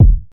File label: clean steel kick drum d sharp key, kickdrum tuned to the D# note; kick drum samples